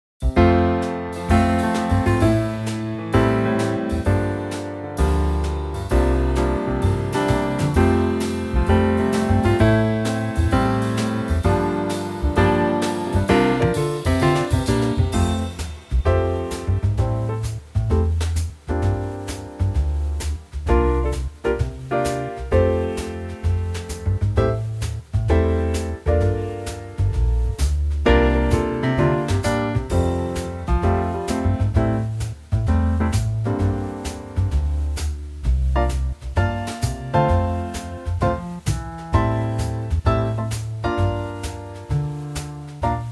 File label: swing F